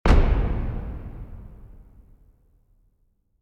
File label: Big Drums